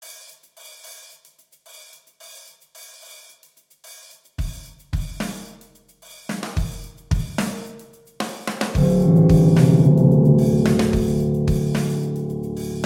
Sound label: BGM